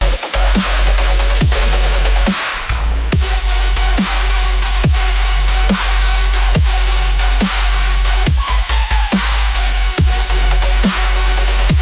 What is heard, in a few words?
electric heartbeat